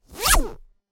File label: zippy light swoosh